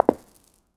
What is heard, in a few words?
right footsteps on concre